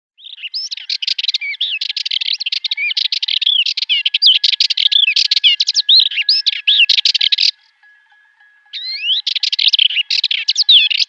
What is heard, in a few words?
Sardinian Warbler song